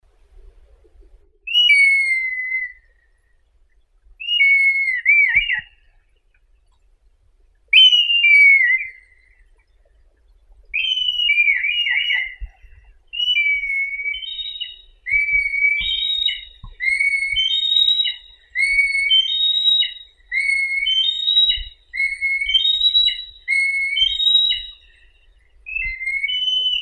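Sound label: red kite, Milvus milvus; korshun krasnii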